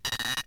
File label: Panulirus homarus rasp